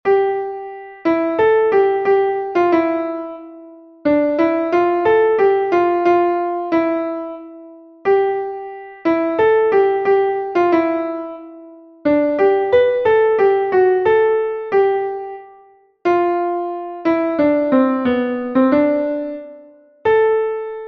naeher rueckt die truebe zeit, klavier

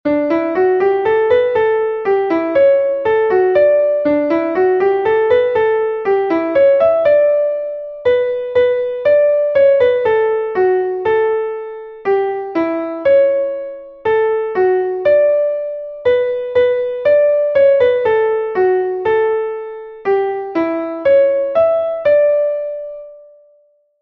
horch was kommt von draussen rein, klavier

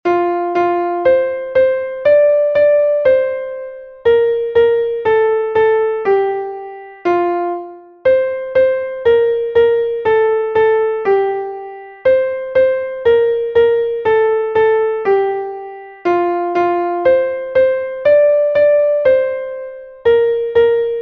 morgen kommt der weihnachtsmann, klavier

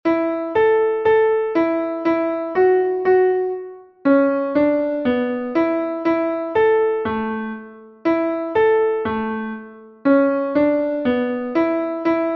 kanon klavier; ich armes welsches teufli

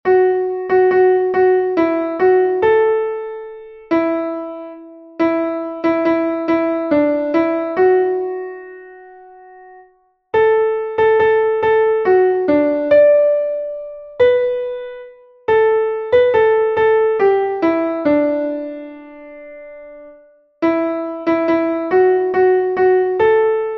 suesser die glocken nie klingen, klavier

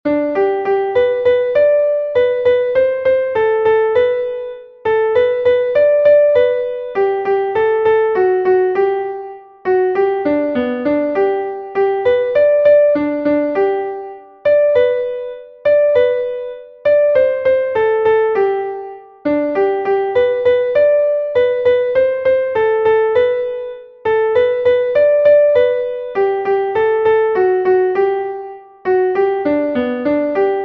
nicht lange mehr ist winter kanon, klavier

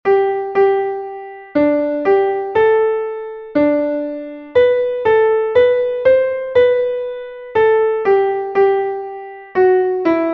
herbei o ihr glaeubigen, klavier

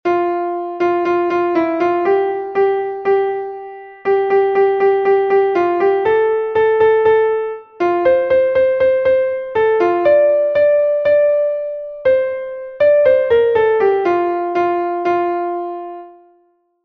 drei chinesen mit dem kontrabass, klavier

